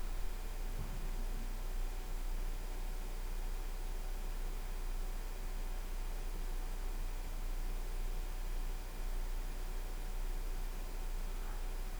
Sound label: Background Noise